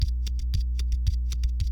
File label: footboard comparison pro d2 piezo back barefoot